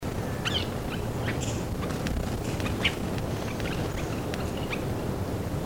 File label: Taiwan Barwing